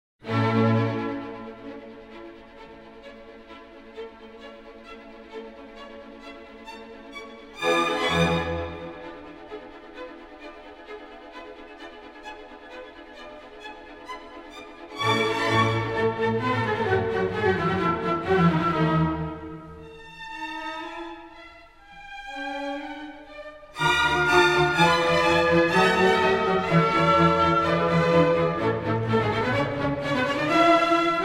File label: Violin Concerto No. 5 in A major; Mozart Violin Concertos 3 & 5